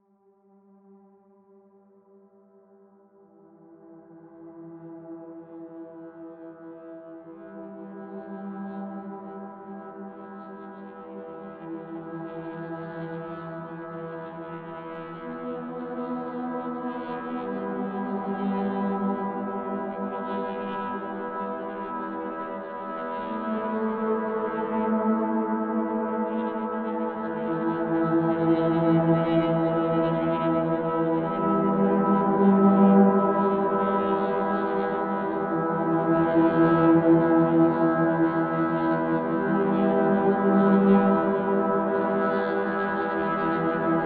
Forest Call; evacuation; windows gamerip 2023

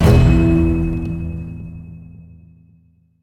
sting Shock; the walking dead michonne 2 give no shelter

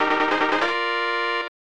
Horse Racing Win Bet; A Ressha de Ikou 3

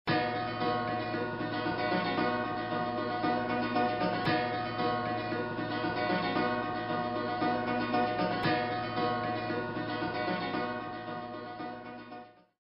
TV Music (Testing Sound)

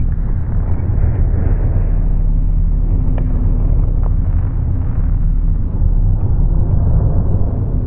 Atmos; In the Line of Duty Firefighter

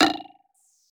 hollow

